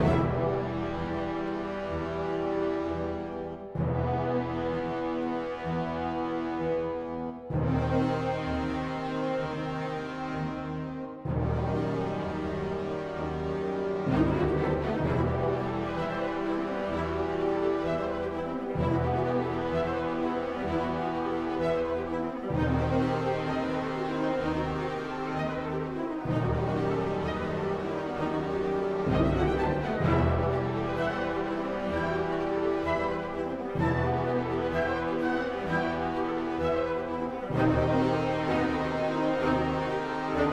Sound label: Dramatic 64bpm Loop; Music Dialogue